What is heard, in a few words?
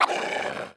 throwable attack